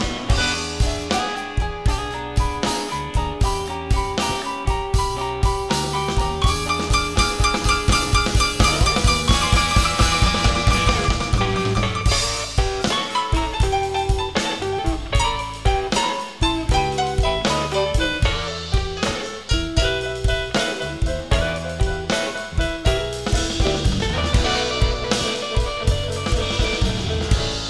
Uptempo Pentatonic Slow Blues 6 8 feel 12 bar Guitar Phrasing